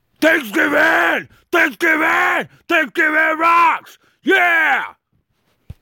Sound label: Thanks Giving Rocks Ringtone